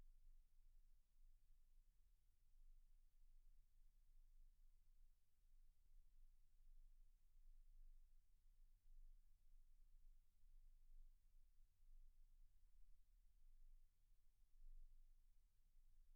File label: MAIN VOC